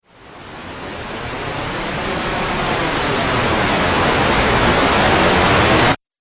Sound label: Trailer Riser White Noise; Rise And Dive